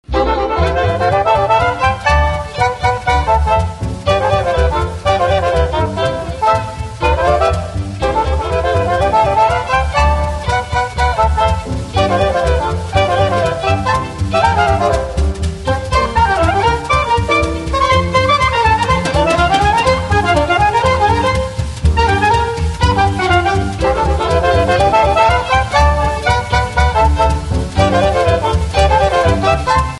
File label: Dixieland